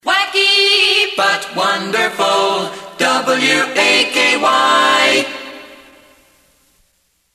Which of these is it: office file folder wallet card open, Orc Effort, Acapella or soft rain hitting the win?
Acapella